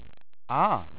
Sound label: "ahh"